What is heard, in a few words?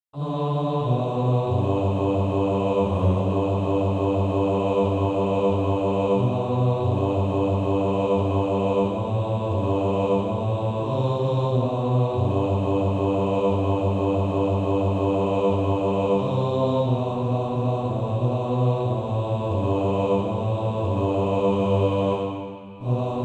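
Male Choir, Practise Music; Bass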